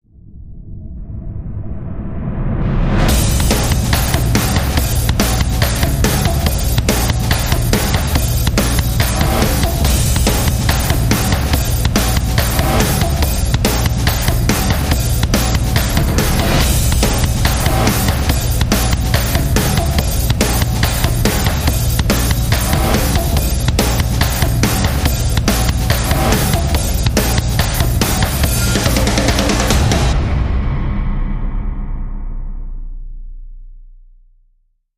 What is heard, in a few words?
Slammin Drummer